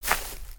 Footstep Grass; creatures pets

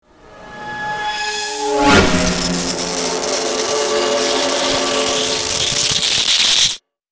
dark reveal energy flash crac

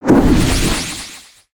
Knight Lunge Explosion; Valiant; aronwk collection; ndaudio

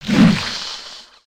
baby spider attack; creatures mechanical

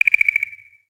plains bird

